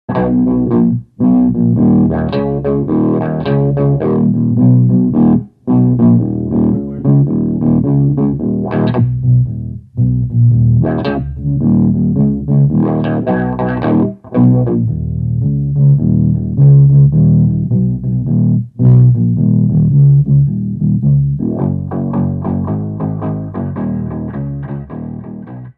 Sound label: Bass Pog with octaves added